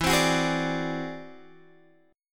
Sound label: E Augmented 9th E down Guitar Open G